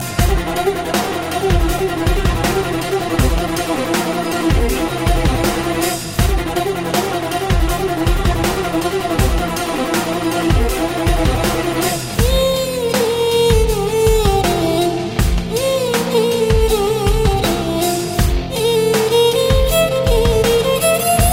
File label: violin mix; Anand; Indian